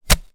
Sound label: industrial shovel single dig into soil; zapsplat